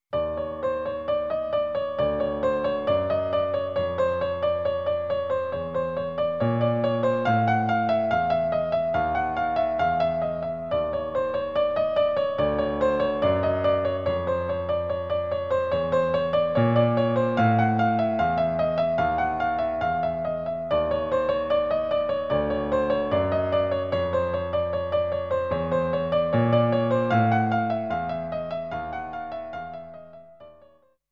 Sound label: Castle BGM; Tanoshii Beyer Heiyou Super Mario World complete music collection 1993